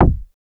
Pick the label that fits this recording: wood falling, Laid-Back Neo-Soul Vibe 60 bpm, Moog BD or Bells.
Moog BD